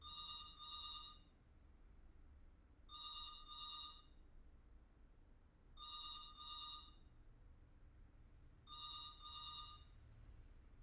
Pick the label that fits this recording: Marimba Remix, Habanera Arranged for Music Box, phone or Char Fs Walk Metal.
phone